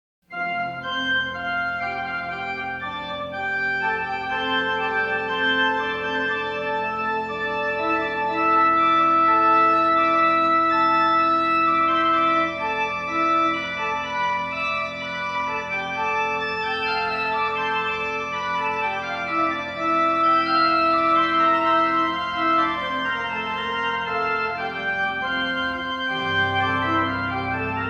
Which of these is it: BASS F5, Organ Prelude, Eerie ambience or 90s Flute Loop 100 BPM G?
Organ Prelude